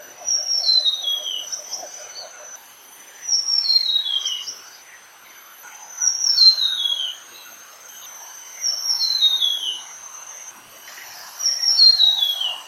Tickells Blue Flycatcher calls, Kledang Sayong Forest Reserve Ipoh Perak Malaysia